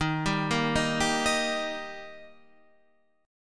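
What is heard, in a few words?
E flat Major, Guitar, Half Step Down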